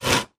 handsaw stroke

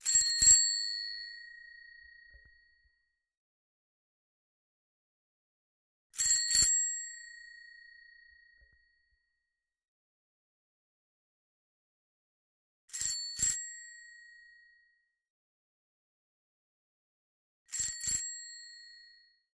Bicycle Bell Dbl Ringi

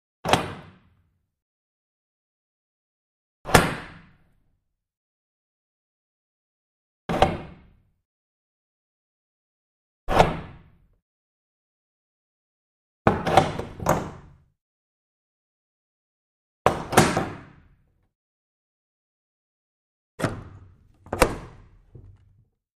Bolts From Wood And Glass Door; Medium Size

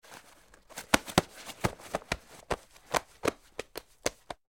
Body Pats Frisk, cloth, pats

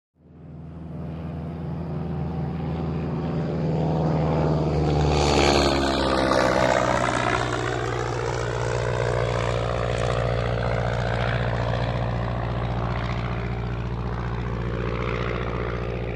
1940s Prop Plane By

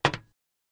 FS Loafer On Metal, singles metal

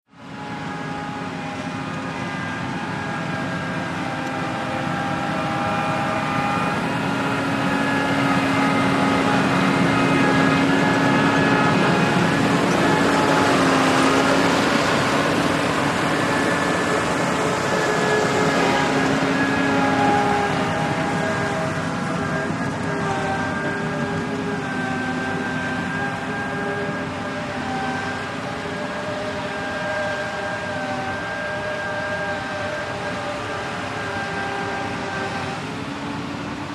750 Dozer Strt Idle, JOHN DEERE

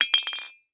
Light Bulb Drop No Break; light bulbs

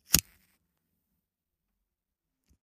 butane lighter

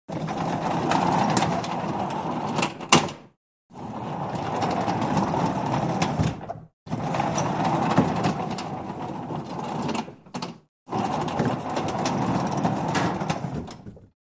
Garage Door; Manual Garage Door

